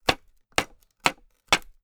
empire game profession woodworking crafting hammer nail